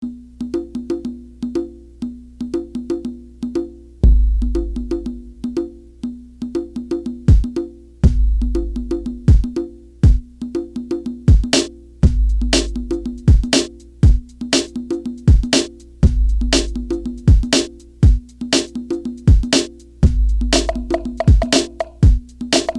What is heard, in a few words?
Electro Salsa